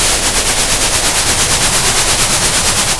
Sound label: pop, Sound of a train